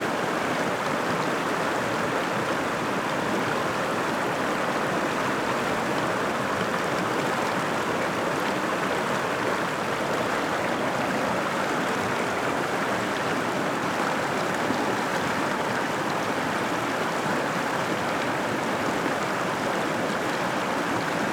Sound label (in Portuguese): Cachoeira perto da queda dagua a direita